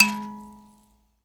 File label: PLK Grand Mbira